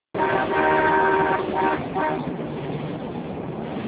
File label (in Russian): Gudok Auto